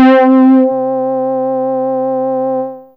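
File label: Chorus Brass C4, Fairlight CMI IIe; Brass1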